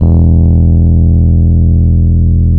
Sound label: E 0, Electric Pianos; Wizoo